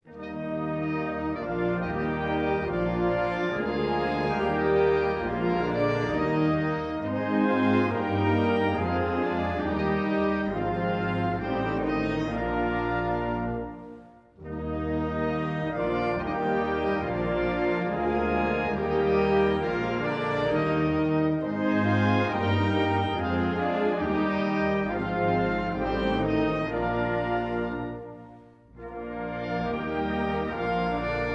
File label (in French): hymne allemand